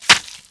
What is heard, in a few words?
Hit, Spinner